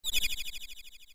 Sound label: bird; ff3; ff3us